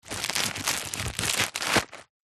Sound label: first aid kit pouch fabric cellephane wrapped bandages insert; household